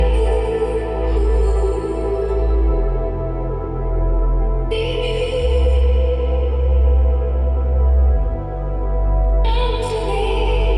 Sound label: BPM 89